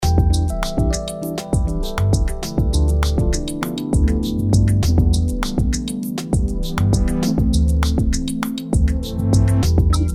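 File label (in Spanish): 100 lento; relajante musica libre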